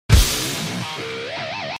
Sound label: Squeel